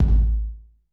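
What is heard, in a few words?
WET ROOM Kick 909; Masterbits - Soniq Elements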